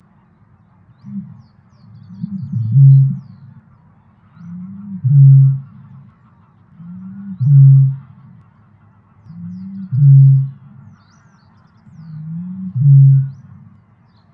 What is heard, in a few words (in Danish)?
Roerdrum; Biodiversitet